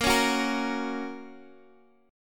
Bb Minor Sharp 5th, Guitar, Open D; x,x,8,7,9,8

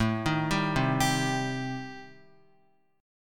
A-Minor 7th Flat 5th, Guitar, chord; arpeggiated

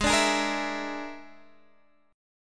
A sharp 7th Flat 5th G sharp

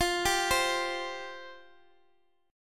C 5th F; C5/F arpeggiated

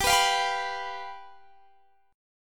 G 7th G down Guitar Standard; G7 strummed; JGuitar

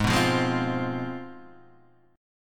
C Major 7th Suspended 4th Sharp 5th, guitar

